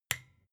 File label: desk lamp off soft